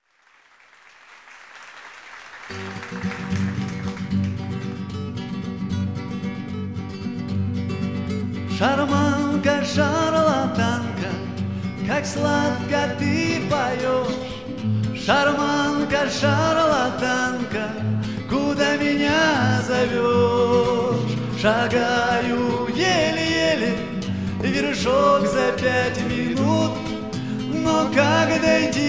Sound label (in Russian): Шарманка (street-organ), Бардовская музыка